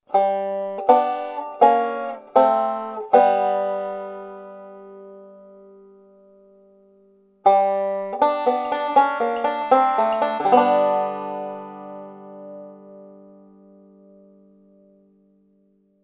ending lick mit triolen

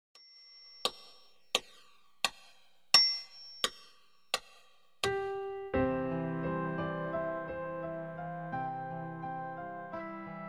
vogelgesang

